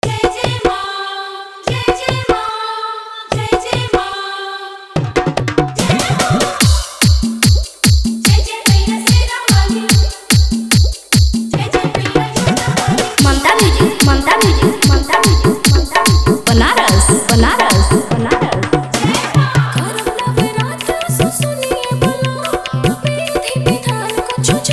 Bhakti Dj Remix Song

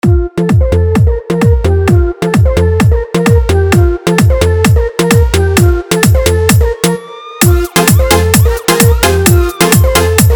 Hindi Dj Song

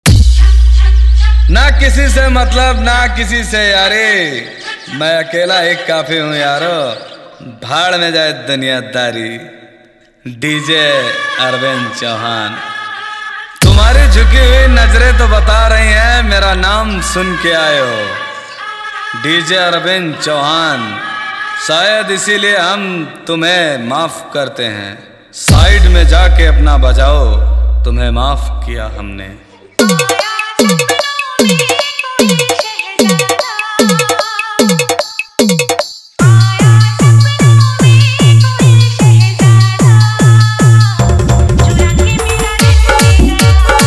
Hard Vibration Bass; Comptition Khatarnak Dialogse

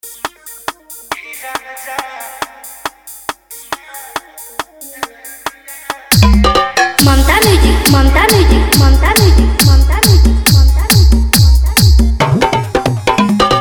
New Bhojpuri Dj Remix Song

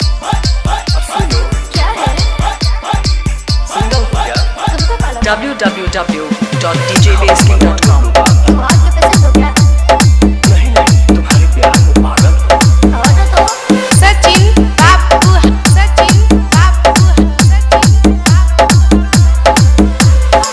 Hard Vibration Mix

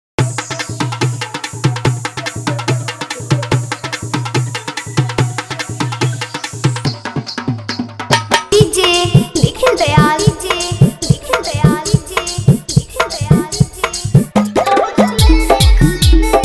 Bhojpuri Dj ReMix Songs

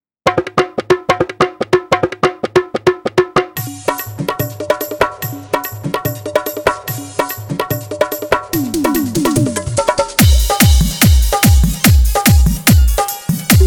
Hard Bass Mix

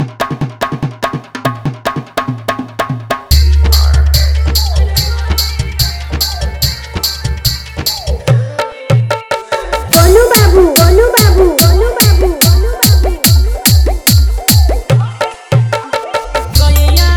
New Bhojpuri Dj Song

